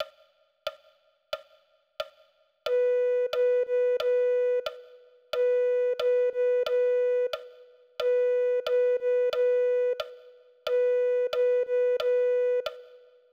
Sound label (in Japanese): リコーダー